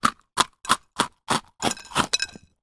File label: human eat crunch bite biscuit cookie fast munch comical crumbs plate